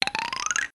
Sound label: Wooden Scale Up